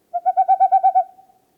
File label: Owl